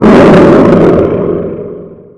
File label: dragon strike